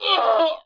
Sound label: player death; Daikatana